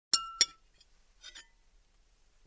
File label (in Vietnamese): tieng muong kim loai va cham voi chen dia